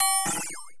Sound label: Ding dong